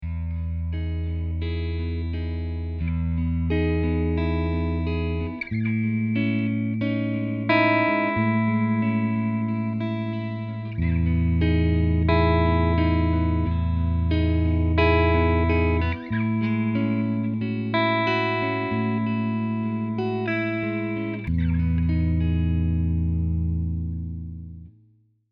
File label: Jazzmaster pickups